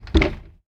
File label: wheel Animation Final Stop; jingle spin